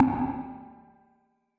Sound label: verby tone C2, Verby Tonal Kit; UNUSUAL KITS and FX